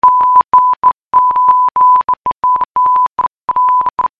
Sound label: Mine Morse sound